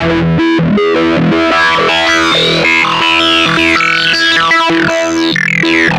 080 NuMetal Synth; Pro Tools Essential Demos; Essential Loop Demos; Demo Session - Rock Hard; Digidesign

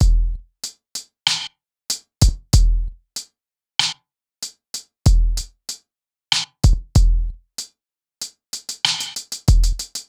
Hip Hop Beat, Drums

